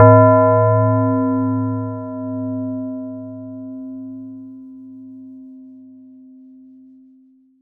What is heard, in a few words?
Yamaha TX81Z Tube Bells C4